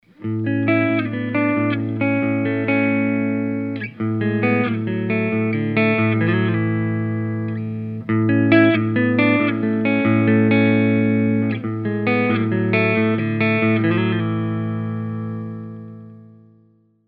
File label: clean, humbuckers; altitude; pickups